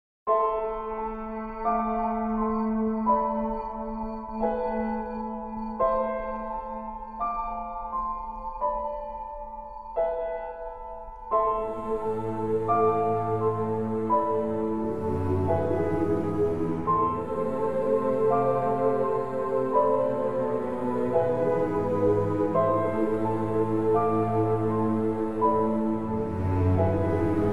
suspense music

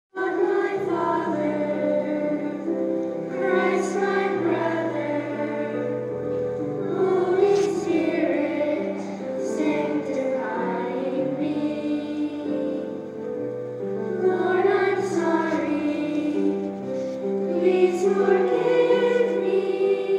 singing sacred silence

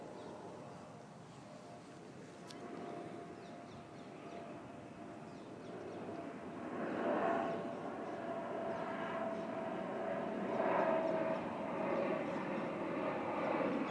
planes parakeets at kew s japanese garden

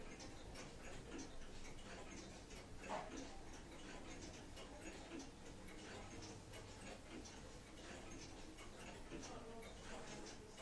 clocks

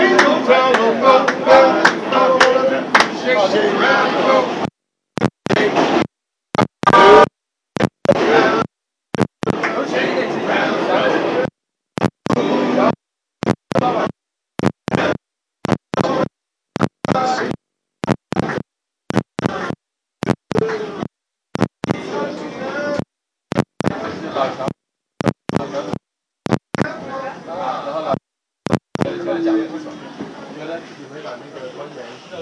singing group on the r train

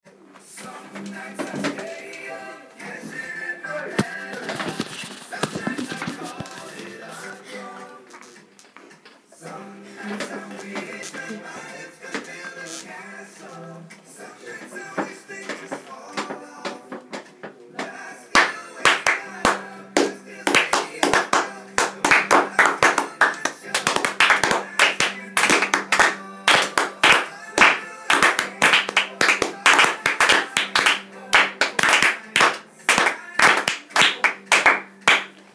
clapping piece with backing track

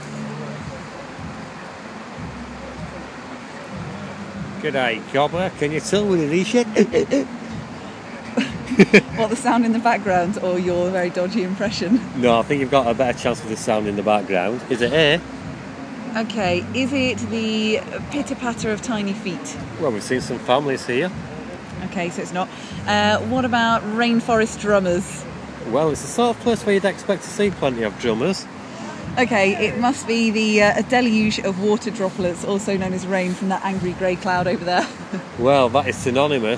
wobbleboard antics

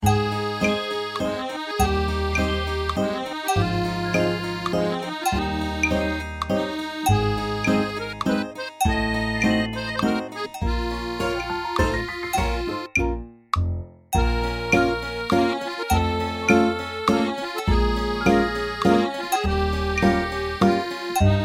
3 4 waltz beat

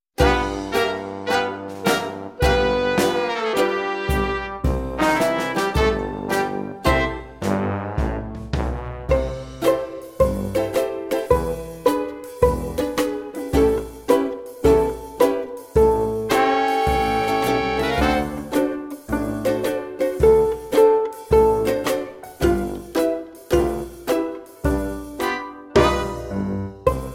ukulele

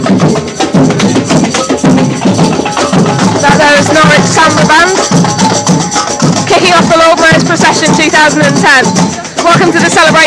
samba band; lordmayors procession begins with norwich samba band

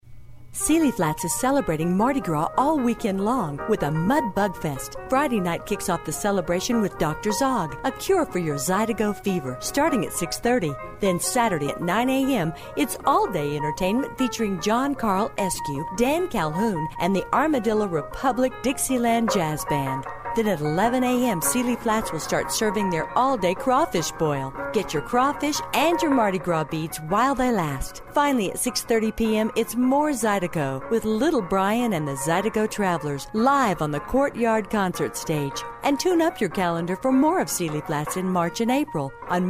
guitar; sealy flats

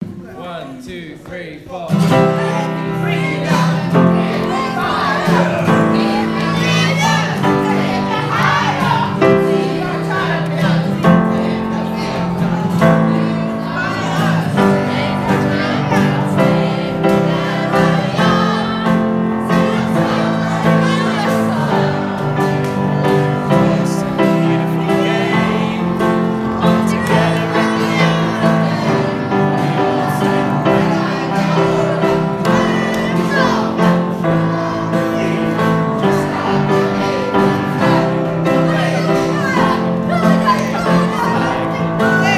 school choir; lansdowne

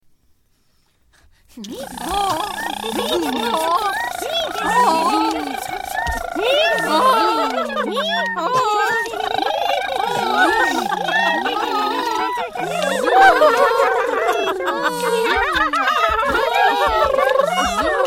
crazy crowd

